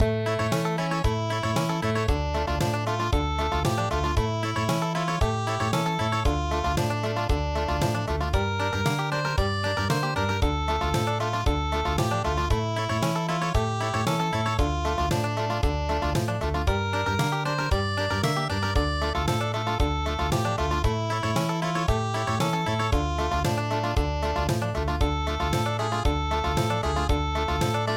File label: Country